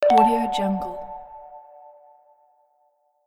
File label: arcade button positive